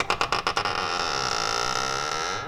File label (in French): grincement porte